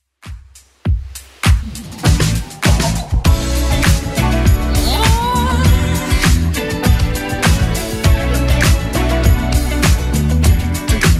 80s Club Edit 100bpm; remixplanet

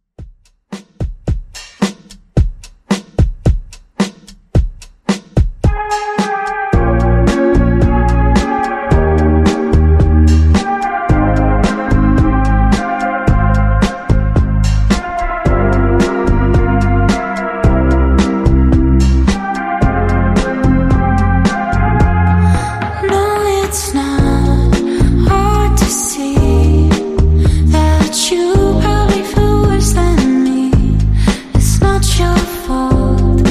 110BPM; MIXSHOW

